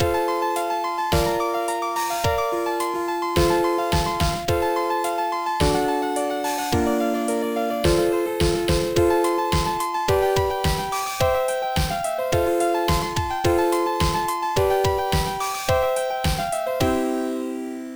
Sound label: Short Piano; MusicMaker V8